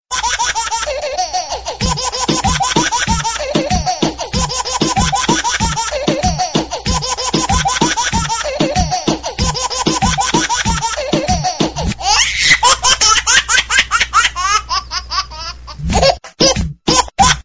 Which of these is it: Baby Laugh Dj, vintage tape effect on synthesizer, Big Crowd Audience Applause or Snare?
Baby Laugh Dj